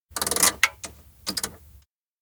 cockpit buttons operate; anemoi

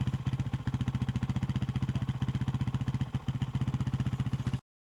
motorcycle Idling; anemoi

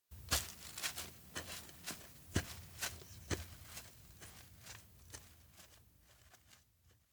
steps outdoor grassland horse walk away; anemoi

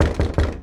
doorknock; anemoi

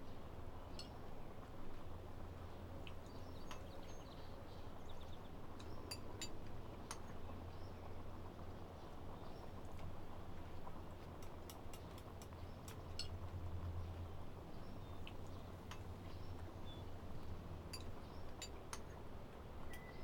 indoor morning meal; LOOP; meguriai; anemoi

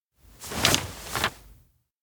diploma receive; anemoi

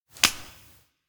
high five; rikka; anemoi パッケージ版 1.1

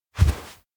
kigurumi hold hand; anemoi パッケージ版 1.1

